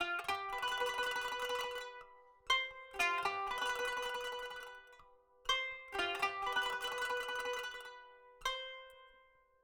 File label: kanun